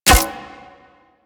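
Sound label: Full Draw Mech, Weapons; Warframe; Infested; Inf Cernos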